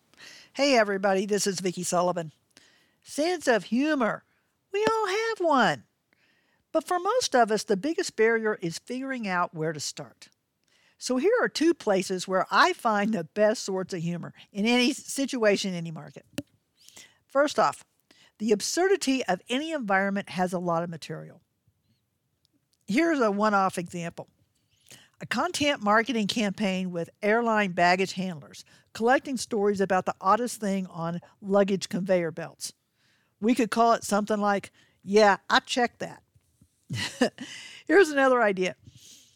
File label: laugh out loud